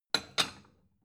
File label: plate small shelve soft